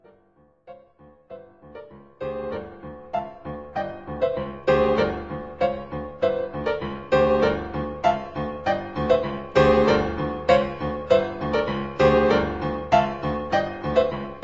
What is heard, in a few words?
piano; finalfantasy6; piano collections